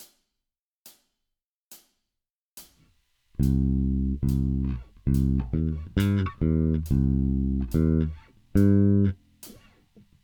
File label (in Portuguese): sequencias, baixo rock